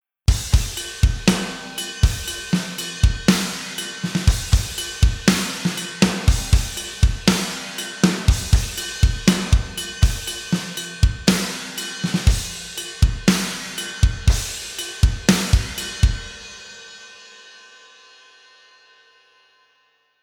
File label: Metal Ballad